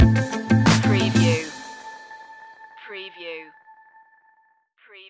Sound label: analog epic stinger